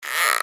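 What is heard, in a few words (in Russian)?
ras, zvuk rastiagivaniia, stretching sound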